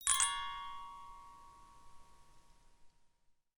End Of Task Notification

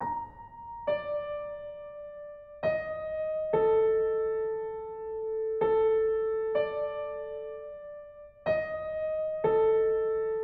Lonely Piano

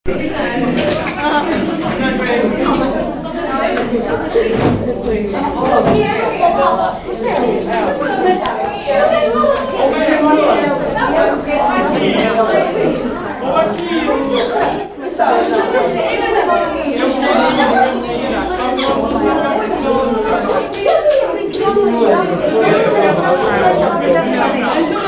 Very Noisy Kids In Classroom

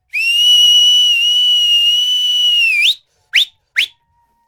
Folk Whistle Long

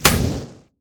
Fire Shot

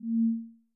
Horror Inspect Sound Ui Or In Game Notification

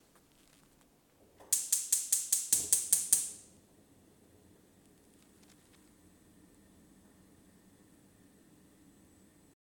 Gas Stove

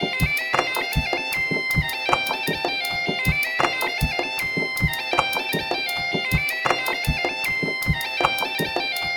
Piper And Drums

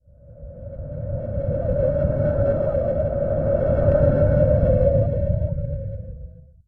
Wobbly Ghost

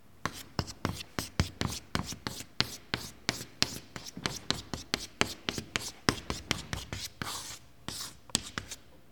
Chalk Painting

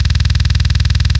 Slow Double Pedal

